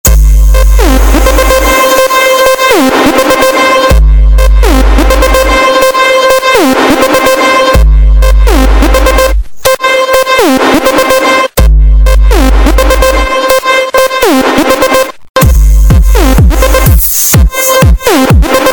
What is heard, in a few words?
bassssss